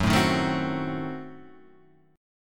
F sharp Diminished 7th F sharp; strummed; JGuitar

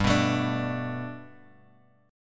G Major Add 9th G down Guitar Open G

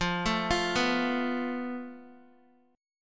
F Major Flat 5th, Guitar; FMb5 arpeggiated; JGuitar

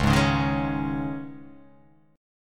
C sharp Suspended 2nd C sharp, chord; strummed; x,4,6,6,3,3,3,x

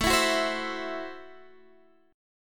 A Minor 6th A Guitar Standard; Am6 strummed; JGuitar

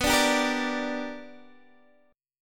B Minor Major 7th Sharp 5th Guitar Open D

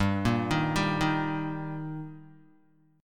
G Minor Sharp 5th G Guitar, chord

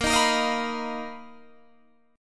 B Major Sharp 11th Bb

